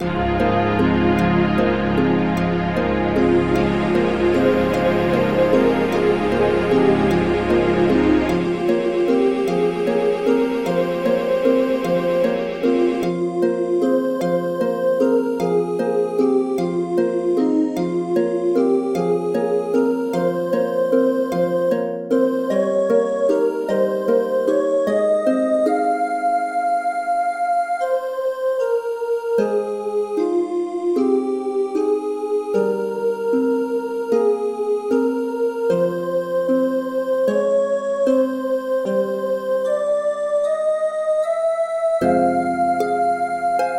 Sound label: Christmas Music